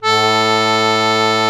AKK ORGAN A0, AKKORDEON, Alpine Volksmusik; Best Service

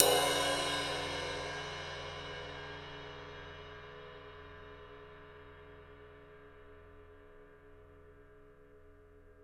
Ride, EMU PX-7 Drum Machines

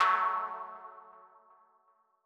Mono DBeat F#3; KG MegaSaur

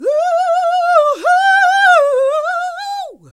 GOSP. Singin

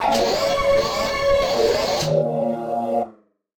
Casio, Glitched, malfunctioning synth

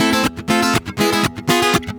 GUITAR SALSA

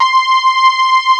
SAW, JV 1080; Pro-Rec - Synthsonic

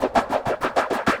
Echo Chuff Splat, Synthetic Pulses

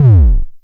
BASS, Dual Percussion Synthesiser; Klone

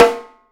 Studio Snare; E-MU Producer Series Vol. 5 – 3-D Audio Collection; 3DPercussives

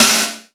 SD, Yamaha RY-10, Drums

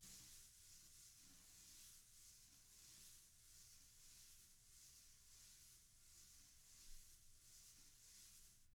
TAMA BR SW, Drums; ILIO; Double Platinum Drums 1

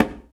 Conga Slap; Roland BK-5; Percussion

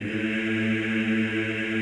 EEH, Classical Choir; Best Service; Extended Classical Choir

